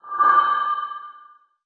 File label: Music Feedback